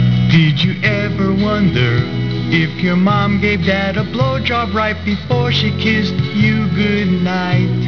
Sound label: mom kiss